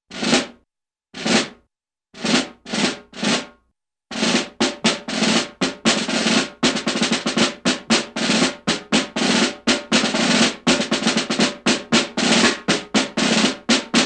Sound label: Snare Drum Beat; Cartoon; Hollywood Edge; Cartoon Trax